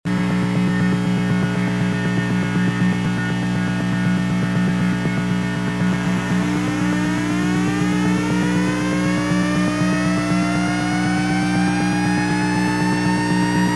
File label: lm386 osc